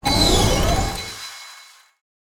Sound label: Space Lift Door; The Sound Pack Tree